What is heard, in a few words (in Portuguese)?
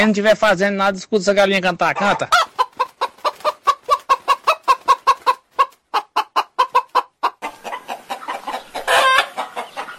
escuta essa galinha cantar